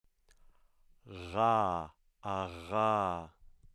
voiced uvular fricative

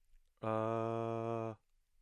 mid central unrounded vowel

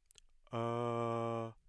mid-low central rounded vowel

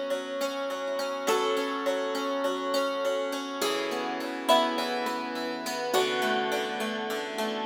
dulcimer

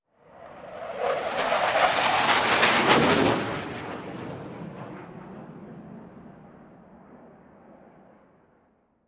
jet flyby

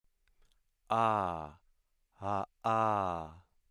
voiceless glottal stop

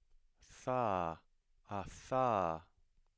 voiceless dental fricative

